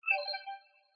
chat message receive; jade

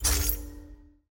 ui items button hover

